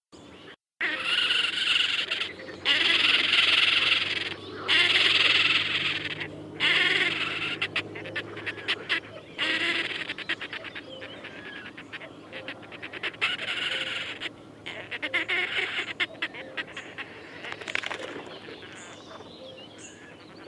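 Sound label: red-necked grebe voice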